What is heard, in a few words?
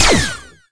8bit atk